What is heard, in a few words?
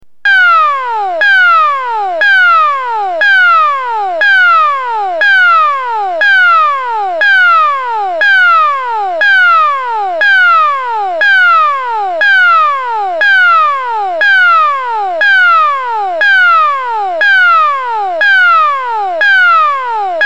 the actual alarm sound